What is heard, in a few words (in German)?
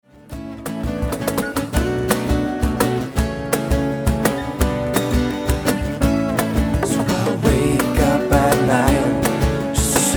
akustisch, chillen